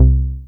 Softy Bass; Best Service Gigapack 2

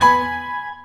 Piano Chord

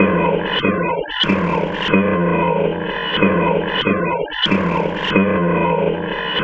Vox FX

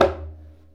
Conga High; Essential Percussion

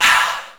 Vox Ah

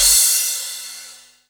CRASH, Dance Mega Drums; Best Service

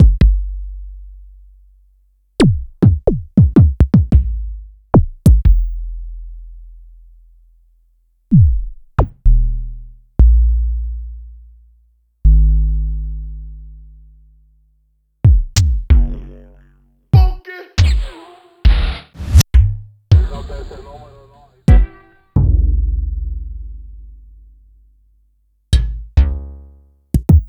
Yamaha DTXpress Electric Kick

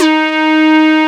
PL MOOG; Wizoo - Powered Nord; STEREO LAYER

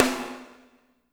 SNR; Spectrasonics; Burning Grooves

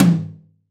Real Tom alt, Roland BK-5

